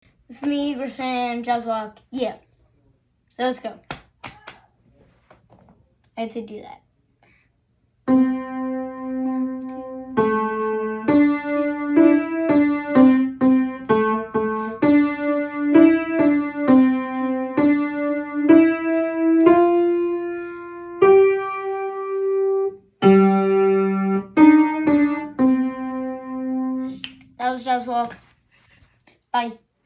Jazz Walk, Home Recorded

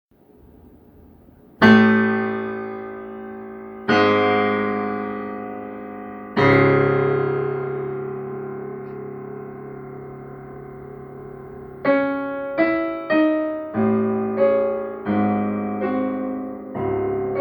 Prelude In C Sharp Minor Home Recorded; Rachmaninoff

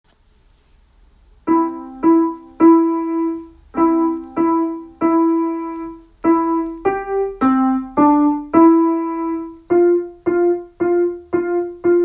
Jingle Bells Home Recorded

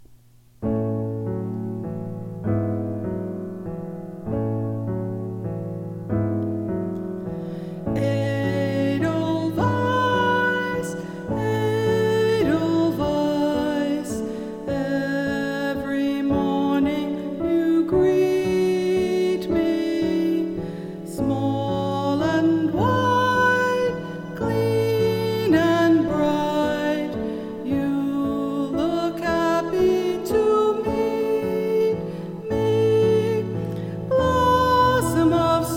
With Vocals

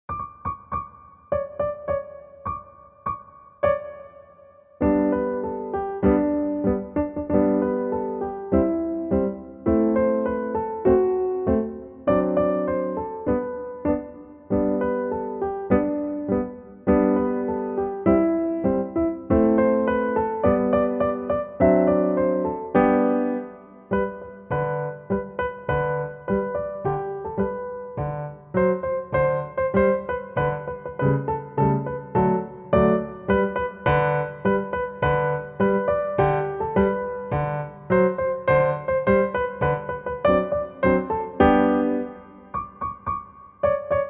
JingleBells

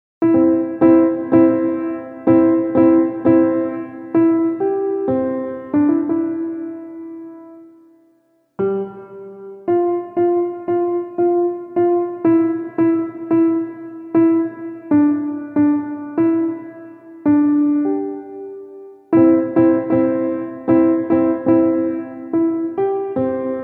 Jingle Bells

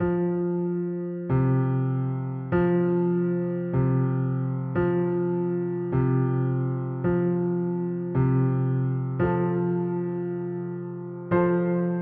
Fanfare

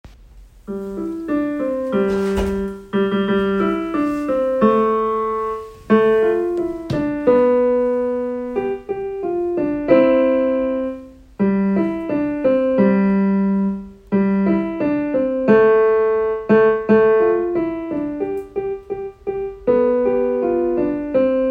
Jingle Bells; Home Recorded

